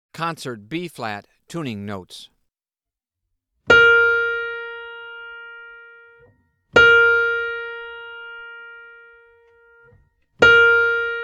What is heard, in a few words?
Tuning Notes; James „Jamey“ Aebersold Play-Alongs